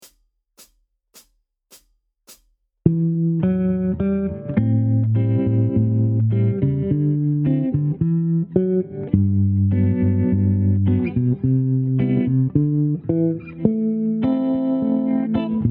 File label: Chord Melody